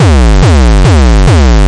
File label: 142 brain melt gabber, drum loops and breaks; 141 to 160 bpm